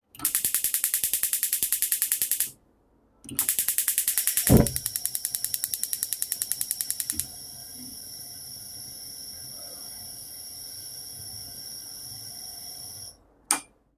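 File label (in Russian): записан звук зажигания газовой плиты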